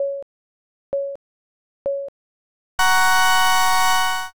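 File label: countdown new year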